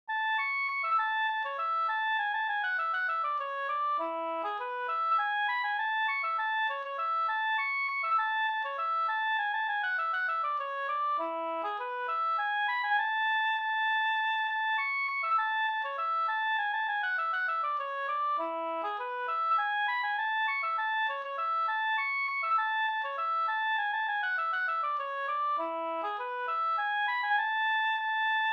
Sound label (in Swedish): polska Bingsjopolska; e Nylandsgubben e Pekkos Helmer